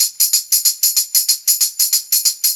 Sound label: Brass Tamb, Brushes, Perc Loops; Sonic Foundry; Loops & Samples Drum Tools